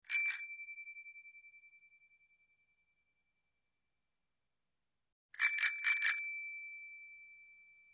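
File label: bicycle bell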